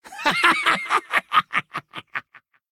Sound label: attack; Mad Mage; Mob Voice